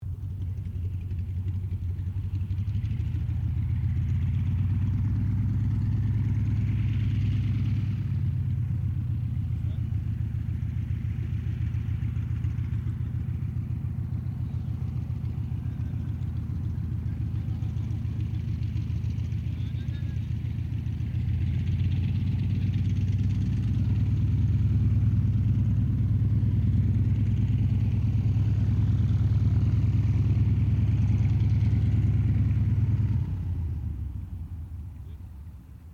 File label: AIRCRAFT CA-28 CERES IDLE SHUTDOWN DISTANT; Airplanes